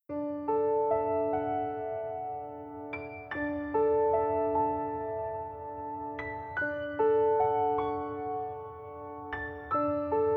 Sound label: crystal oceans melody